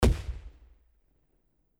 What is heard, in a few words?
m80 fireworks explosive